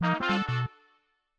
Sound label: Brass Honk; Samsung Galaxy S26 Ultra; Retro